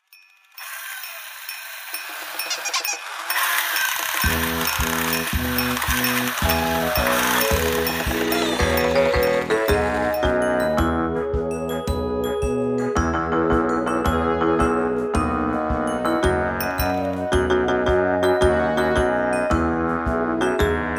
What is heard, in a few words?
come and play karaoke